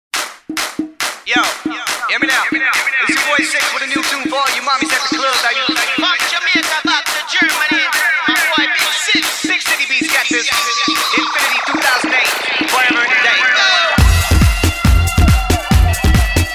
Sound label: electro house dance